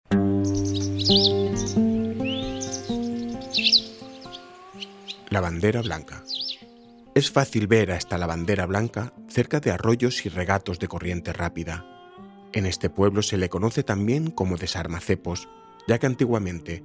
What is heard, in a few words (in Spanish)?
lavandera, aves; lavanderas